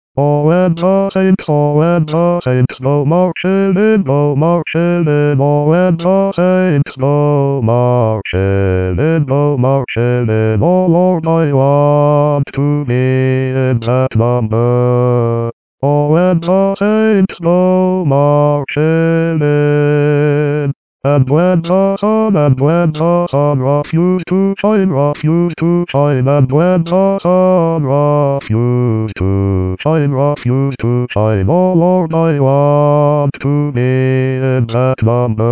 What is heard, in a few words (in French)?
Basse; When the Saints